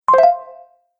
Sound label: Game Quest Unsure, video game